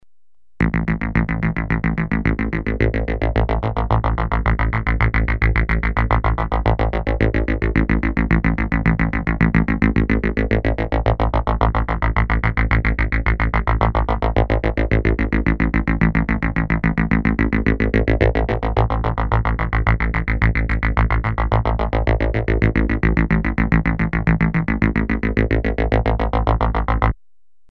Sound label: Bass